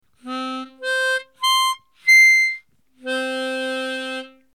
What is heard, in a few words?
octave do